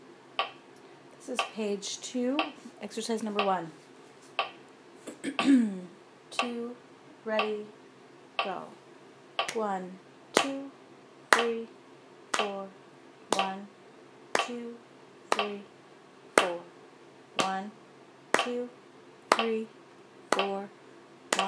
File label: Rhythms